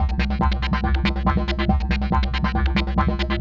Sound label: WAH PAN 140, Trance; AMG - Global Trance Mission VOL-2; PROCESED LPS